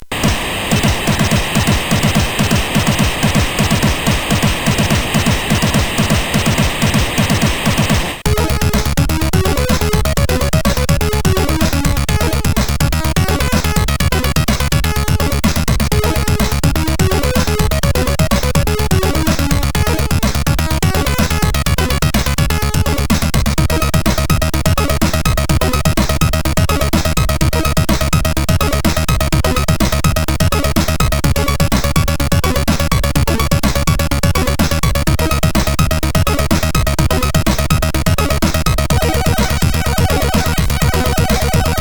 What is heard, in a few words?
Wemen Intro; Signor